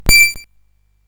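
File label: Moon Patrol, MOS 8580 SID chip music, games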